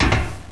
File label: metal